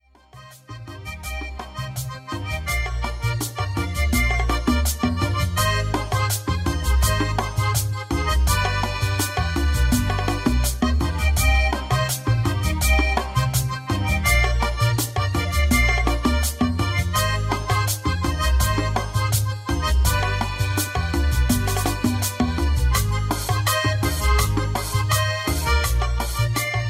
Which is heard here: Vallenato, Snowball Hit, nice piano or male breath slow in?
Vallenato